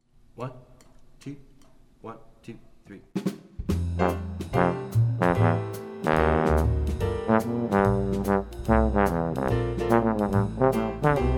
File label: swing etude bass trombone